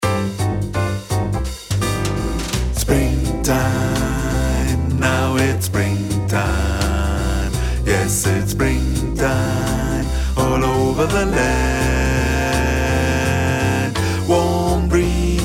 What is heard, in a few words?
Bass